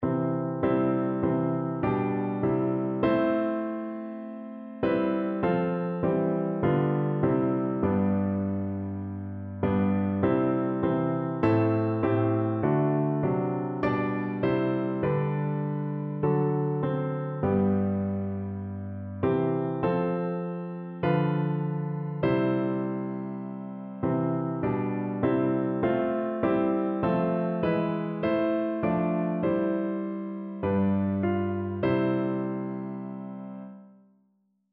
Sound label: rejoice the lord piano